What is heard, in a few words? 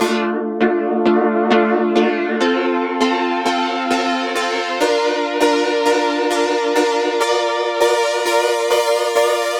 100 D Synth Tangerine; Zero-G; Future Wave